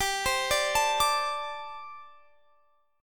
G Suspended 2nd Suspended 4th G, down, Guitar, Standard; Gsus2sus4 arpeggiated; JGuitar